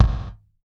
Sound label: GATE, KICK DRUM